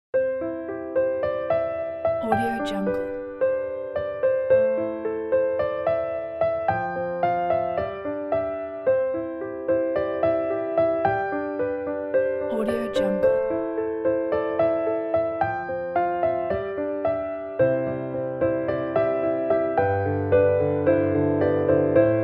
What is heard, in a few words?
inspiring classical piano